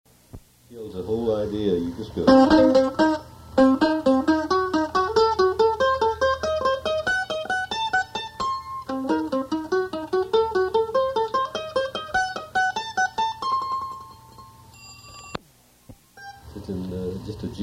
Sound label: Lick Exercise